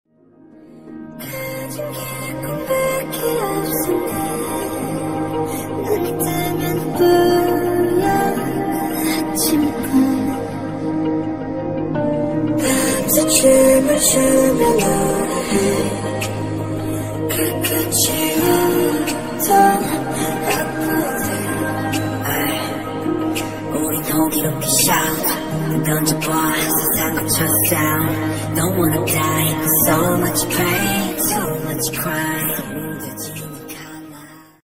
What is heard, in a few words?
ringtone, bgm ringtone